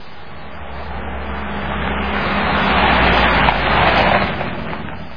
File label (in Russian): fura podehala i ostanovilas